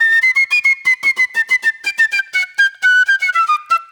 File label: Club Flute; Sonic Foundry (Sony Creative Software); Manhattan Flutes; Super Brown